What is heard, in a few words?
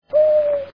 owl, ringtones; wwf singapore